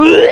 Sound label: vomit